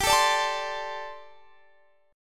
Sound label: G Suspended 2nd Suspended 4th; Gsus2sus4; strummed